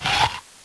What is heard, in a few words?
bite apple, crunch